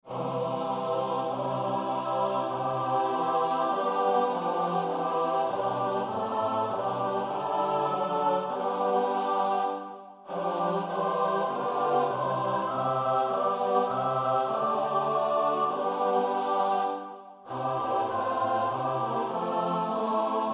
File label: S-A-T-B; Bach (Johan Sebastiaan); LvdK 262; 'Op waakt op!' lb 262